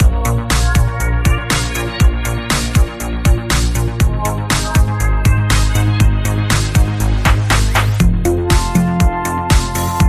Hypnotic Synths Rhythm

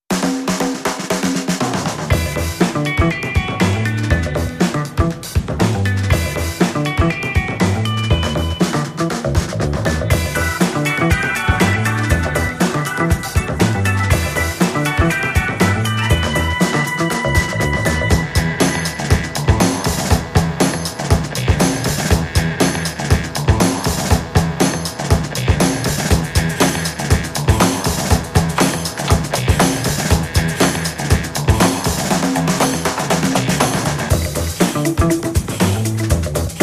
Rhythm Section

